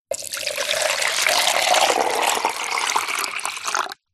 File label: water pour into plastic tupperware container